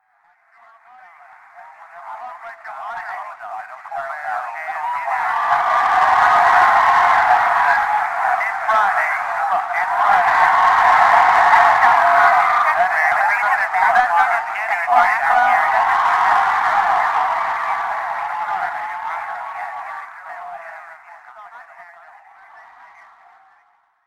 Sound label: TV Sounds